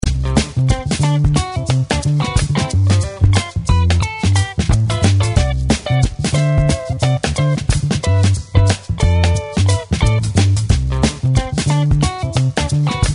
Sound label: royalty free music; alberca; locutortv